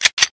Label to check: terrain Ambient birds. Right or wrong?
Wrong